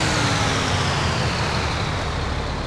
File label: throttle off slow, vehicles; phantom